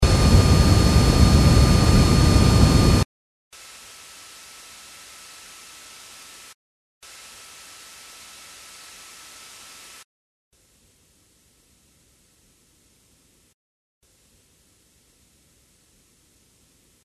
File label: flyover behind